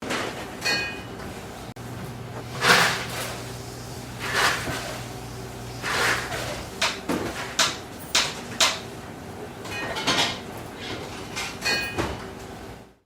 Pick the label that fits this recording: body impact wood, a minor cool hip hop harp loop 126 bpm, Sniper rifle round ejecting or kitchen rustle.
kitchen rustle